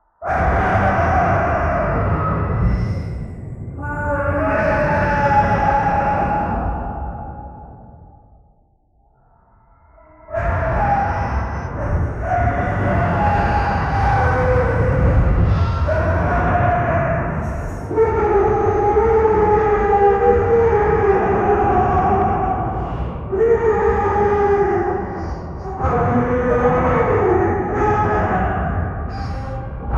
the true sound of hell, screaming

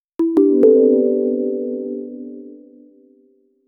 start with a soft digital, major chord

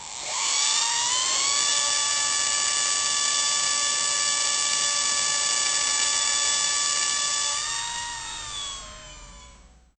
machines

